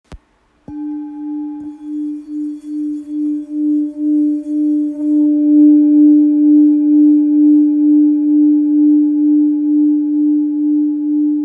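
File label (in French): Full moon re, bols chantants tibétains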